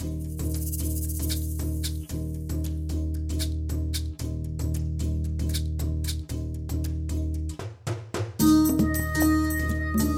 wilderness intense desert

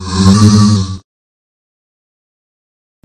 teleport out

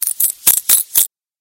monster spider

